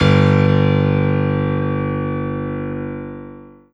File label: Piano Low